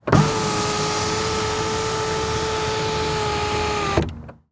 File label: Transportation Car Monte Carlo Open Sunroof